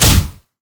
fracture; LoL; skarner

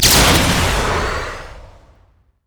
move quick; LoL; super teemo

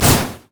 foxfire missile; LoL; ahri

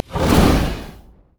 flame breath human; LoL; shyvana

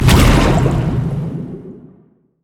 tidal wave; nami base

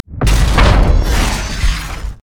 crowstorm; LoL; fiddlesticks

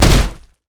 basicattack ohs; LoL; galio

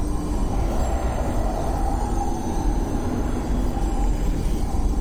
desecrate loop; LoL; trundle base

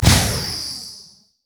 sow the wind; LoL; janna

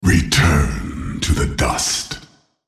attack; Nasus; Character Voices - English

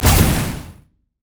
foxfire missile; LoL; ahri

